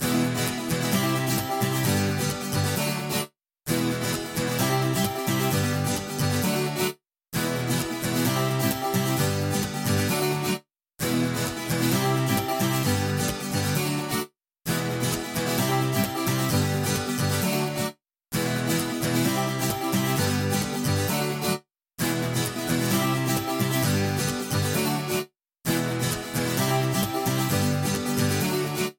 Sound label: Guitar